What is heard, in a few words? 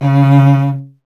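strings cello C#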